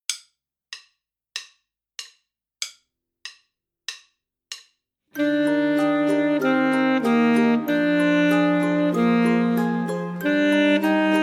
Lullaby Full Sax

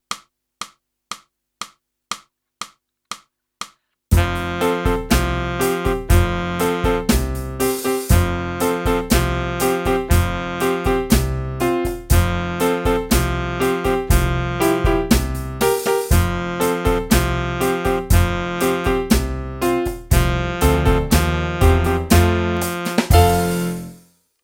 sax

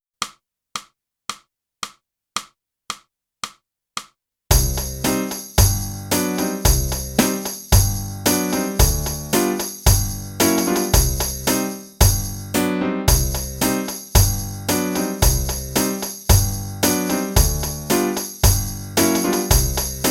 clarinet; (Morgan) - Boosey Woodwind Method: Clarinet (Book 2)

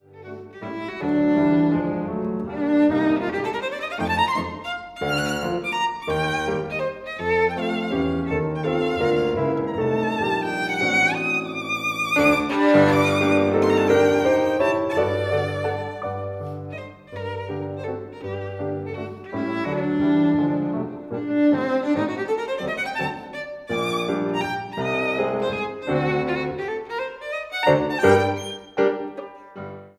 VIOLIN; Trinity College London violin exams for 2016-2019; Elgar: Bizarrerie

